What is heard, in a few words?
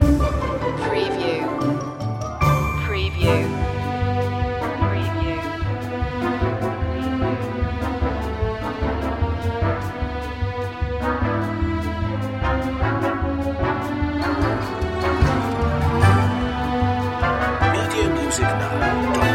nostalgia tv